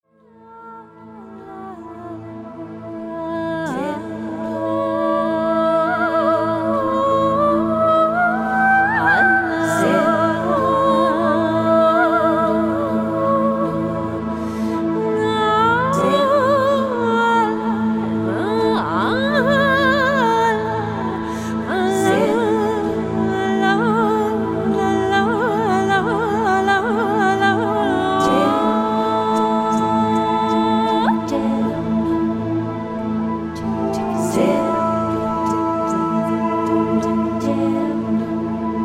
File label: Taqsim